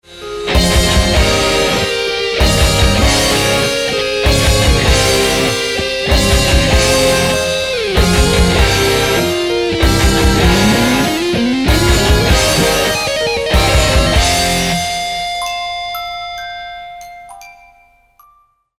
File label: line6pod